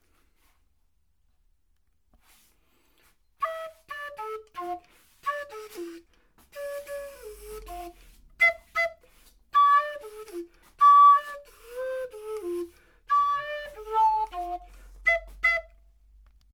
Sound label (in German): blockfloetenversuch; dubuffet